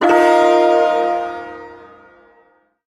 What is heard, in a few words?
Shawzin Blues Chord; Warframe; Duviri Ersatz Shawzin; Chords